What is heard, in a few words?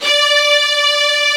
STR SEC D4; AKAI S-Series CD-ROM Sound Library VOL-1